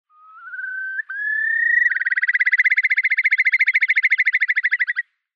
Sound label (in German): Regenbrachvogel Numenius phaeopus